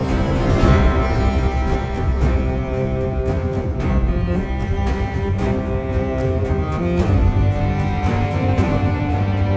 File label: Classical